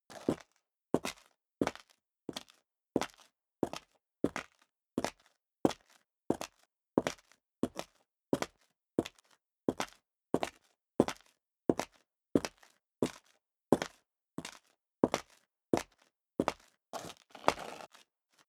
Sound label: step high heels concrete grit walk sequence; schritte; Footsteps